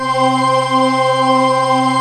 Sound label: JV CHURCH, JV-1080; Syntec; Wall of Sounds